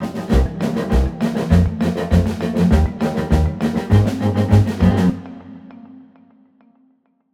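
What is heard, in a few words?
foothills background music head 100bpm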